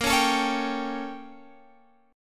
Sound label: A sharp Minor Major 13th, A sharp, down, Guitar, Open G